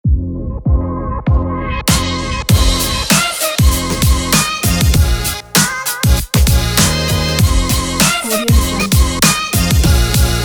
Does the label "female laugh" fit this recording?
No